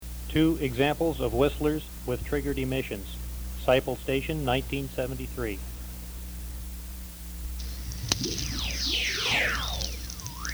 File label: whistler triggered; sferics